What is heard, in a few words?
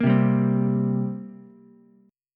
G Minor Double Flat 5th, down, Ukulele; strummed; JGuitar